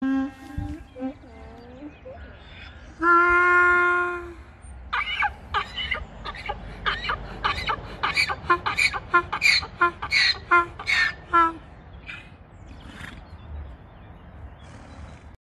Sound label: Donkey braying